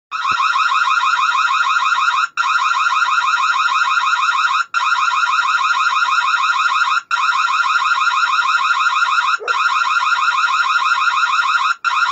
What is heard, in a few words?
Camera Alarm Siren; Synology Reolink